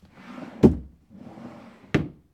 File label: Moving Drawer